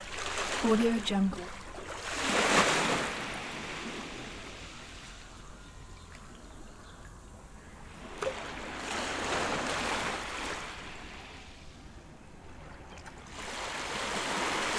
sea ambience

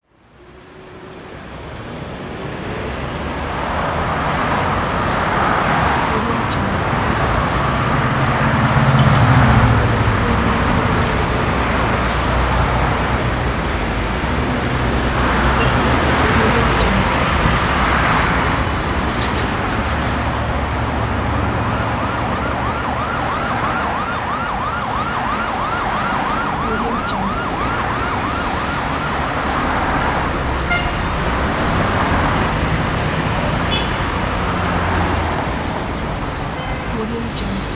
city traffic

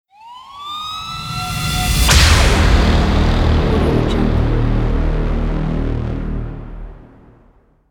crime thriller logo

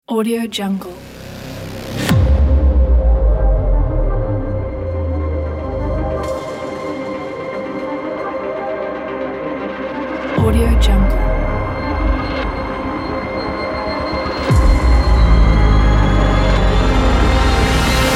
epic sci fi trailer; fatowlproductions

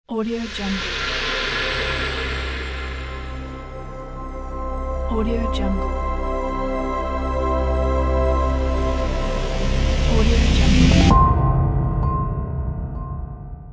particles intro logo

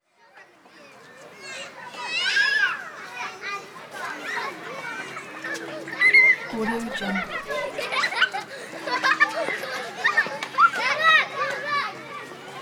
children playing

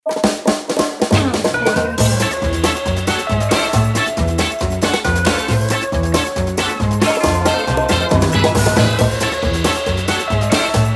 cartoons intro

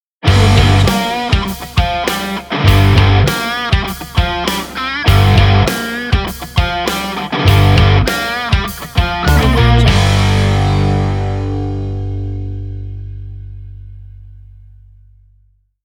rock opener logo; cogunaudio

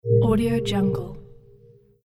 warning signal menu

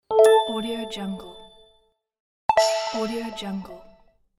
game notification; julesounds

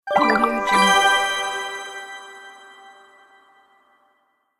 ui melody logo

